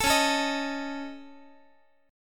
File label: C sharp Suspended 2nd Sharp 5th, Guitar; C#sus2#5; strummed; JGuitar